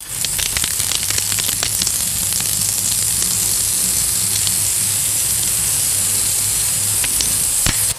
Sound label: wet fat slapped on hot grill sizzling